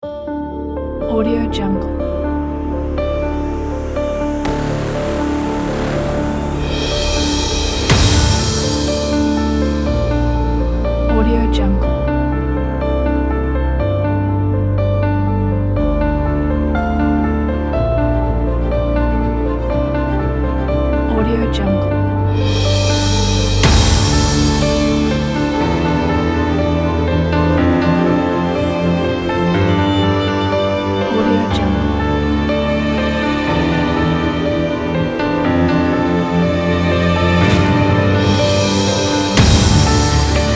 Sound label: electronic hard cinematic pack